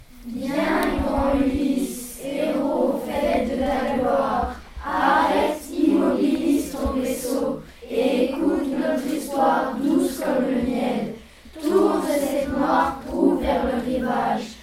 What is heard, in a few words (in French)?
chant des sirènes